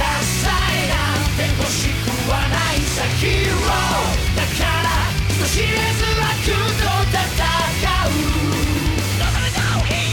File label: Loop; OnePartiyaUdar